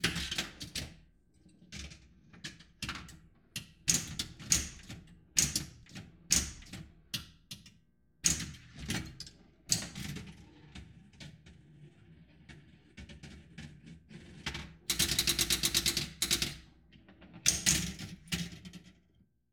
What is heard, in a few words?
Pinball Play